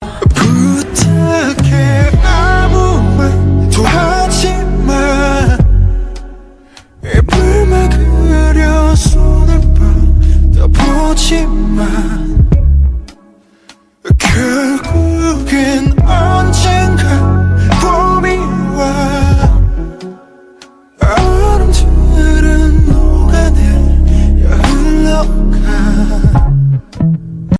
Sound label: korean ringtone